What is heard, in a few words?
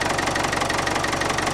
Tractor